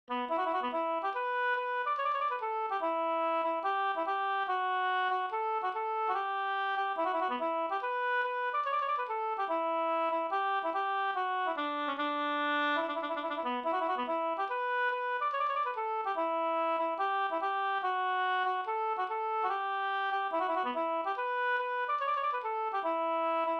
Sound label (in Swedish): reinlender; av Lasse Sorlin Jm